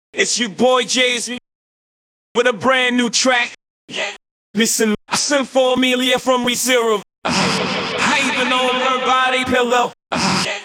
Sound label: studio acapella